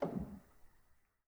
rel, Grand; bitKlavier; Binaural

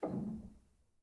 rel, Grand

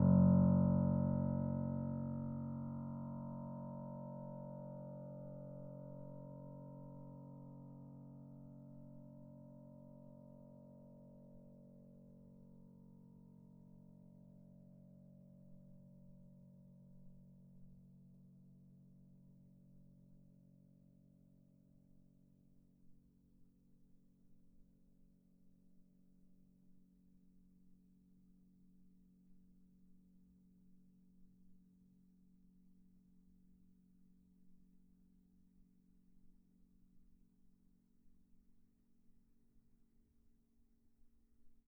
F#1, bitKlavier Grand Binaural

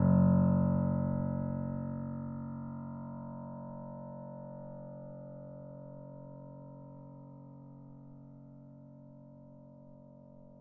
F#1, bitKlavier Grand Binaural

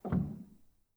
rel, Grand

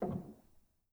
rel, Grand

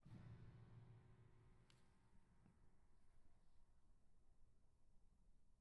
pedal D, bitKlavierGrand Binaural

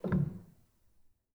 rel, Grand; bitKlavier; Binaural

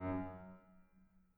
harm F#2, Grand

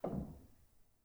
rel, Grand

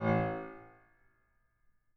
harm A1, Grand; bitKlavier; Binaural

